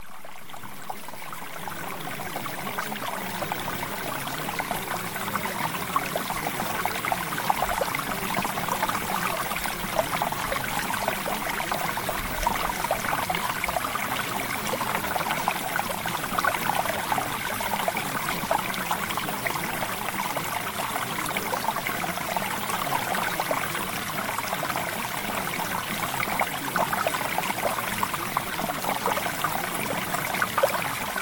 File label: Water; CHAKRA HEALING